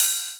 Ride; Equipped Music; Loungin House

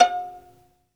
Synclavier Strings, SOLO VI; ILIO

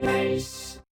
The Real Bass robot, Yamaha DJX 2B

